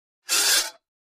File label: Metal Scrape On Metal